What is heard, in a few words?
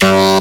Synth Voc; Vengeance Samples; VEE Synth Shots